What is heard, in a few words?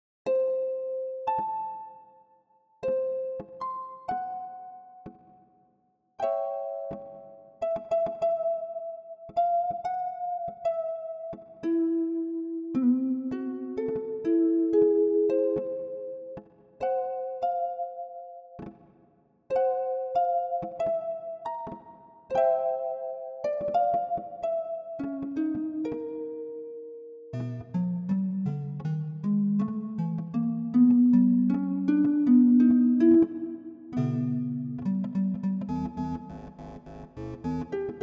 String Studio VS3